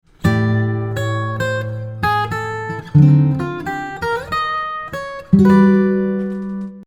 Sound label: first note is D